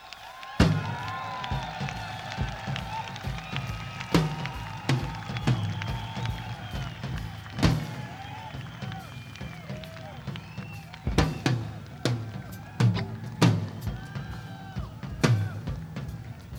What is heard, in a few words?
Drums